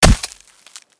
pinata break

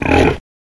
monster orc grunt

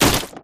barrel break

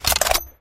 perk pickup

